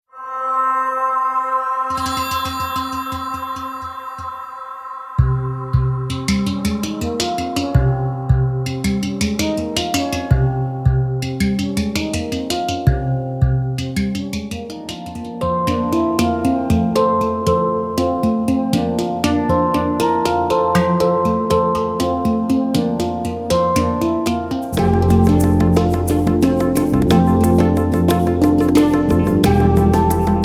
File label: The Hang Drum